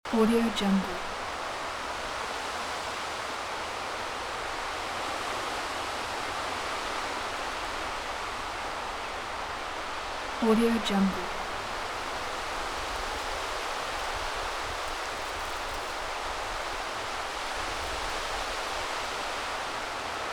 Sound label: gale wind in autumn forest loop